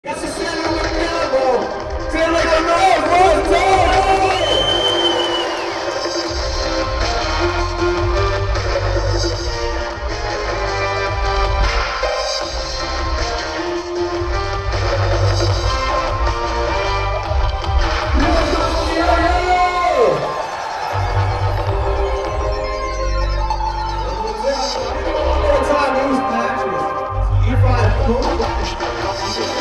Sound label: House; Need for Speed Shift 2 Unleashed; Slightly Mad Studios; Drift 03 House Step 02